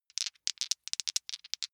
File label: big two rattle in hand